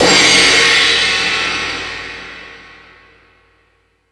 Thundadome, E-MU Pro-Cussion

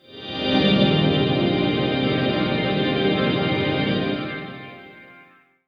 SYNTH EMU; EMU gold; Polyend; Legowelt

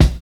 LAIDBACK K, HIP HOP K; Drums In Your FACE!

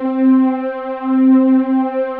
ANA STRING, pads; Pro-Rec - Synthsonic

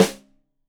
Rim Shot Stereo, drums; Deeply Sampled Drums; SMDrum

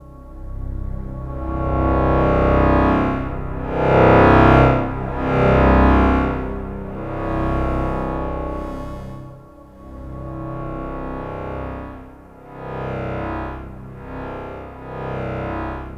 suspense, special FX twisted; USB Soundscan; AKAI

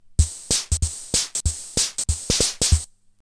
SWING, Eminent Solina Rhitmix

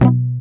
GUI B, Acoustic, Roland

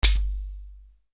Hat, EuroDance

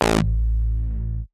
Fartie Bass; Big Fish Audio; Old Skool House; OSH Bass

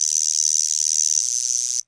CR78 guiro; DRUMMACHINES

